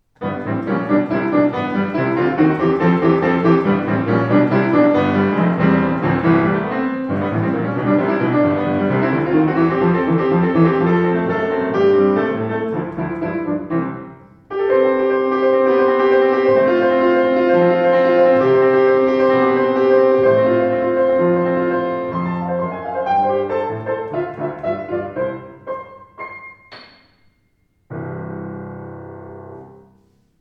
Schimmel Kirsche Rag; 117 schimmel kirschbaum

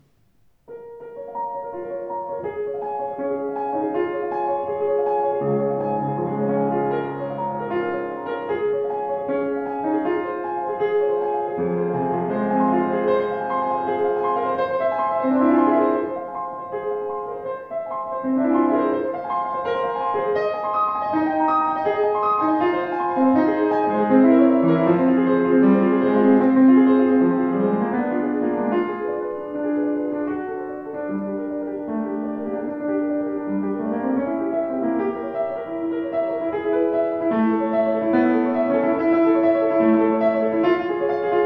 Feurich; 116 feurich

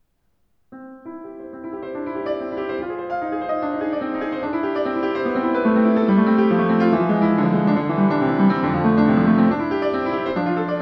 Sauter

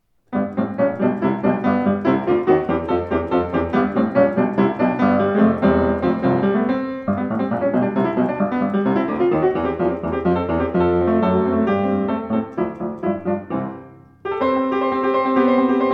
Steinway Z Rag; 115 steinway z nussb

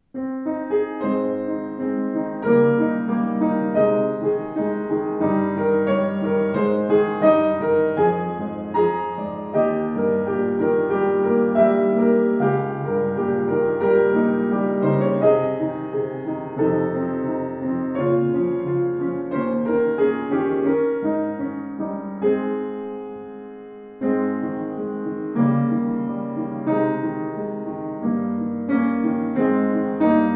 Schimmel, eiche; schimmel 106 eiche

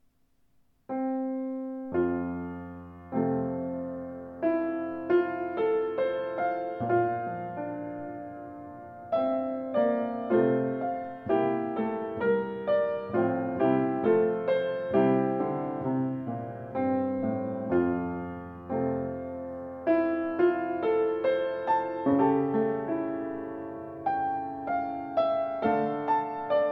Bechstein 12N

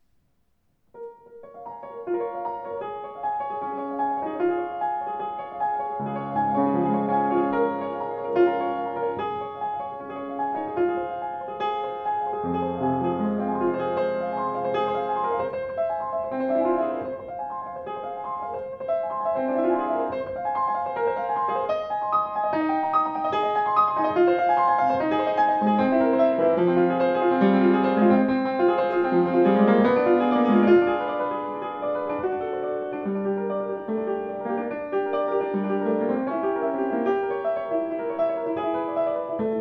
Bechstein 12N; 114 bechstein 12n weiss

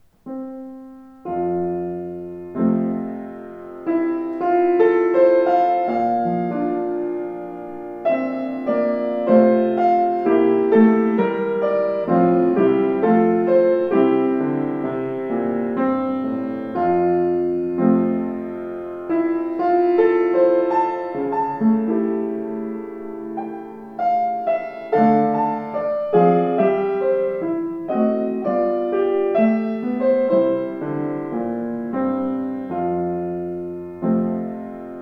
Schimmel

